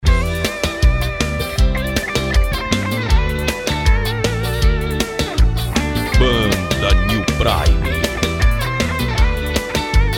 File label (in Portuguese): Banda